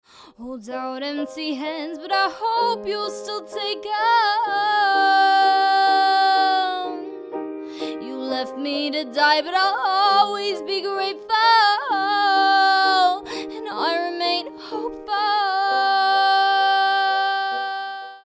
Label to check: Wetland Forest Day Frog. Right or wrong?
Wrong